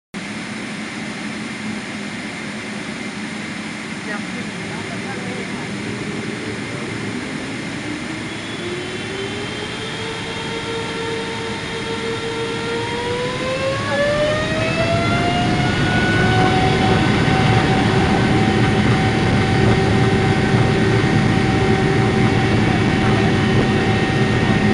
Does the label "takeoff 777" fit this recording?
Yes